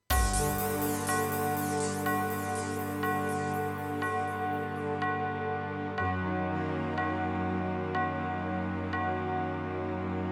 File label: SC880; quest for glory 3 wages of war